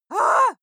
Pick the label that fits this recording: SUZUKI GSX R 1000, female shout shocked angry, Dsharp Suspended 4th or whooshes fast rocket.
female shout shocked angry